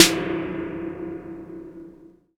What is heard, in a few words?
SNARE Tune, 808; Drum Kit; Polyend; Stazma & Concrete Collage; Spring808